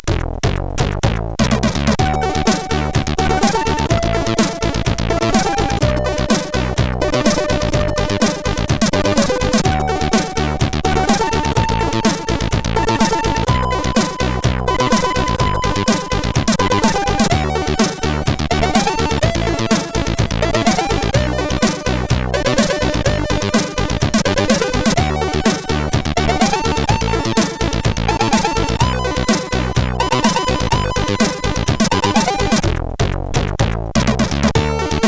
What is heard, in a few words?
sid CSG8580